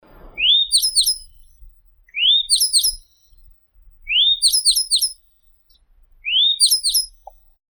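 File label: grey bunting emberiza variabilis; ovsyanka sizaya; zvuki prirodi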